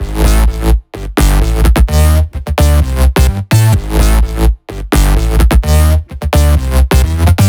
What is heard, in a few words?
128BPM The Big Groove Melody; Vengeance Samples